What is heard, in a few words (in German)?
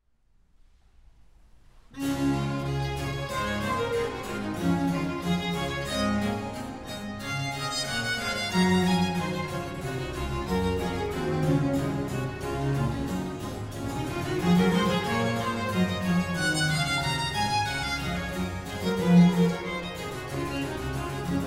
Concerto V Dur Allegro